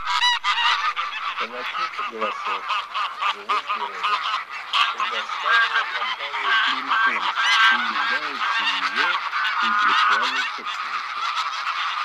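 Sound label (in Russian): Гусь серый базар Oche Selvatiche, манок; plurifon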